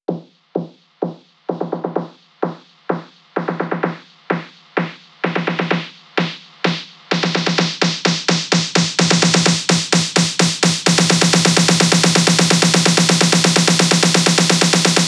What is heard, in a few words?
Drum Loop snare Uplifter Snare, 128BPM; VEC5 Drumloops; VEC5 Multi Loops 128BPM; Loop Elements